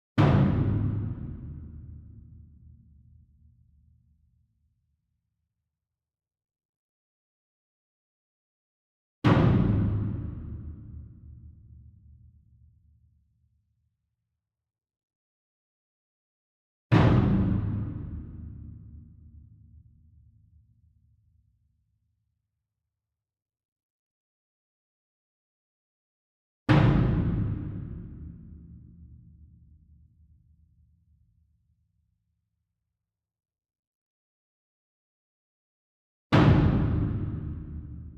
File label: ENSEMBLE DRUM Bass Drum Concert Hit Far; boom library; Cinematic Strikes Construction Kit